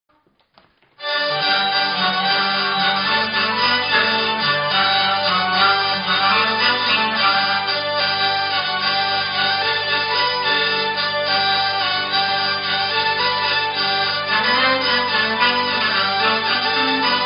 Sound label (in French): bourree transition